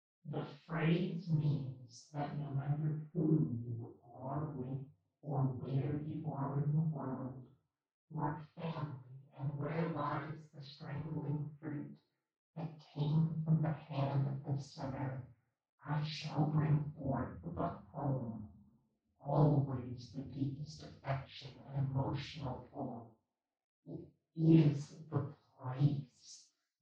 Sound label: Drone; House Of Leaves